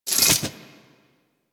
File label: Penance Impact Retract; Warframe; Warframes; Priest